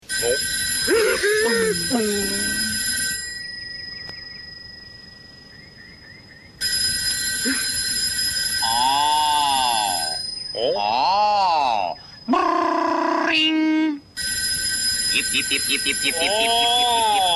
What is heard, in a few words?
ringtone